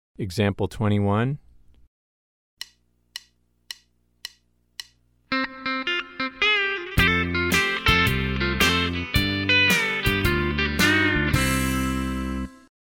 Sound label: Country Rock